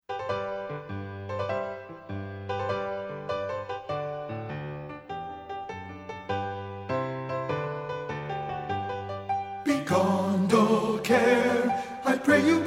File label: TEN2; men; Begone Dull Care; partbypart